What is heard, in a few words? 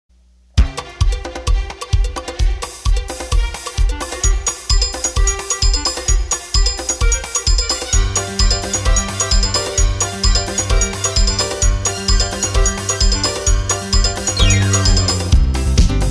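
Double Samba, Roland accordion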